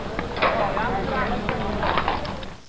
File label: house good; Caesar III